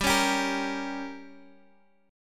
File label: G Diminished